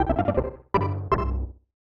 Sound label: Lose Life; Doraemon 2 Nobita no Toys Land Daibouken; SNES